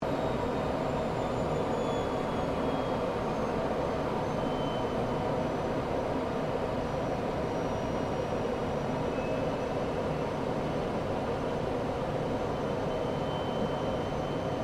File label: AIRCRAFT GLOSTER METEOR F MK8 FLYING INTERIOR; Gloster Meteor figter jet; Airplanes; Vehicles; Aircraft Collection; Twistedtracks